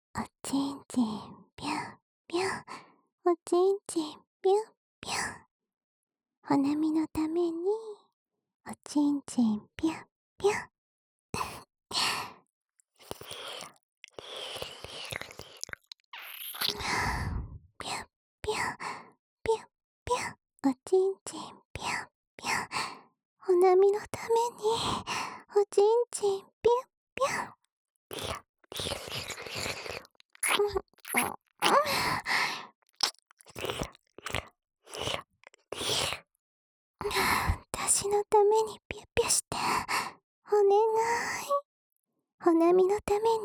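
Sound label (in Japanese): ぴゅっぴゅおねだり耳舐め（右）; 帆波; お屋敷メイドのず～っと4人で♪ ハーレムおねだりオナサポ♪♪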